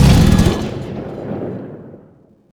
mgsj fire end npc far

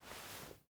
ironsights down; Rust

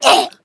pain

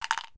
item pills bottle pill take; Escape from Tarkov

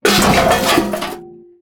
objects, World of Tanks

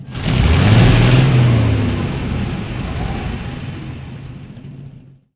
maus engine load, engines tanks; War Thunder

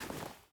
lr300 deploy; Rust

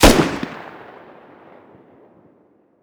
lr300 attack; Rust

